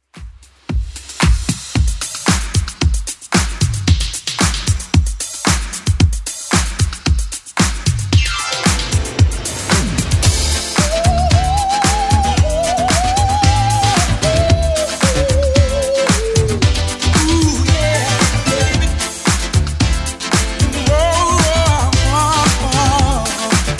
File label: 80s Club 113bpm; 80s Club Edit